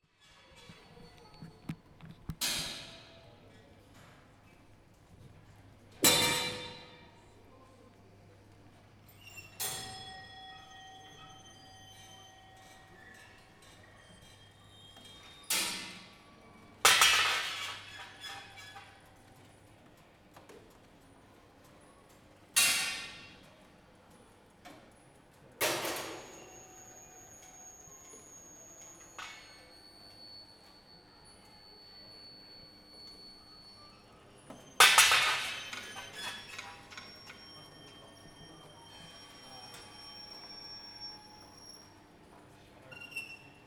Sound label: Basel Tinguely Museum